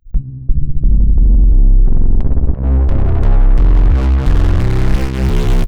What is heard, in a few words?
Bass FX, Drum & Bass; AMG - Prototype Drum & Bass